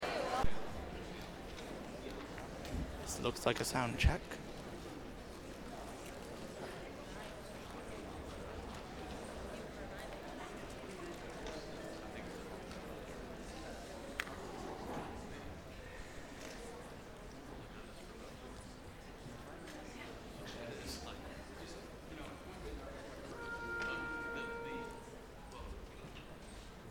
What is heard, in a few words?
instrumental